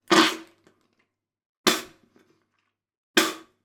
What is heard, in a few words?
human spit toothpaste bathroom sink room reverb; zapsplat